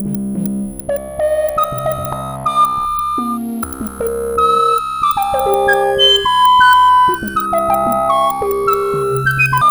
COMPUTERS, COMPUTACHATA; Zero-G Ambient 1

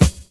LOOP; X-Static Goldmine 2

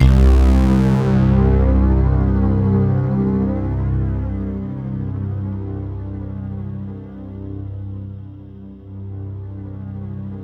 C1, Roland JV 2080 CLUB ORGAN

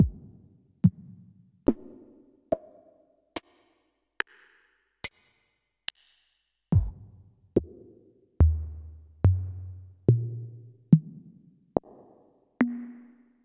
Yamaha Tenori-On Snapkit

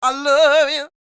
SING, Vocal Planet Blues Soul BLUESY

